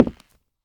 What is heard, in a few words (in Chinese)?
下界木踩踏声